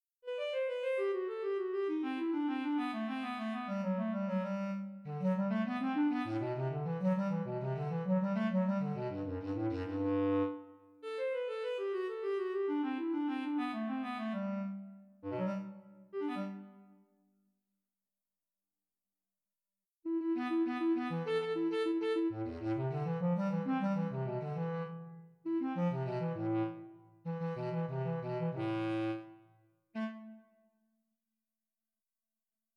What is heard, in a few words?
Sententiae for Bass Clarinet